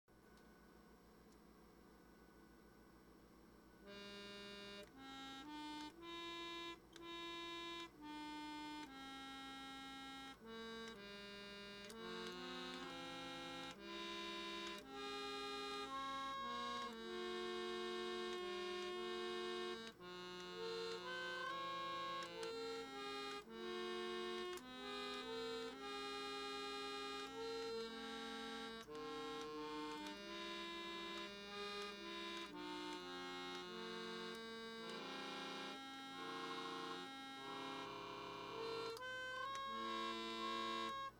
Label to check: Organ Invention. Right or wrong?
Right